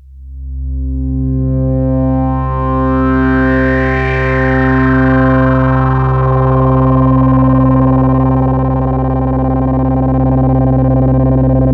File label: pad C2, 5U Modular; Polyend; Simulacrum Sounds; 1 Shots; Synths; Pads